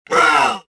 pain, rodian

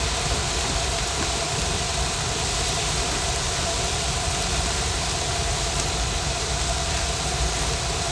houses grill; Tony Hawk's Project 8; XBOX

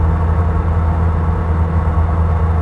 pedestal move lp, movers platforms

